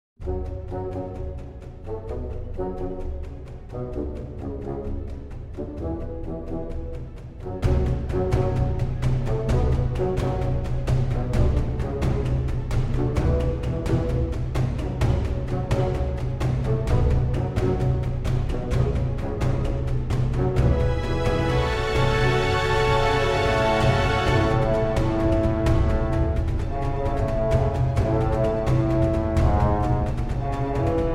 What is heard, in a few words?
bgm battle